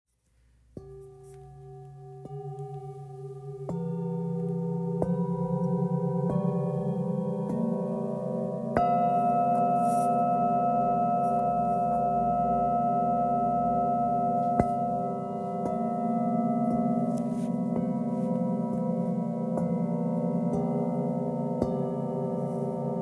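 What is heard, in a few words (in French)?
Set, bols chantants tibétains; jupiter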